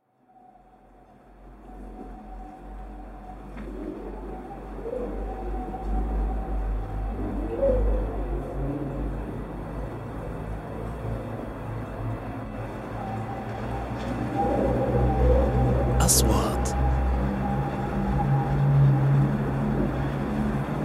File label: storm